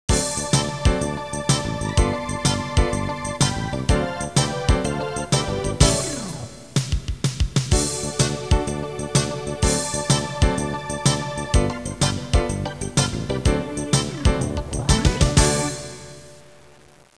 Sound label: blues shuffle; QChord; Suzuki Q Cho